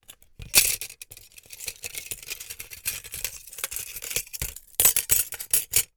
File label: foley glass peices shards handling movement